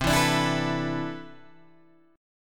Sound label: C Major 13th, Guitar Open G, chord